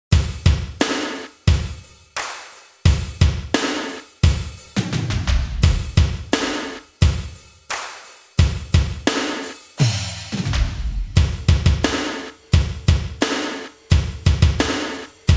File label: glam